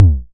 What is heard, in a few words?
Vermona Kick